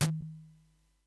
boom medium

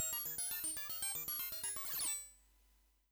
ditty; chenjesu

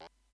cone; umgah

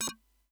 laser

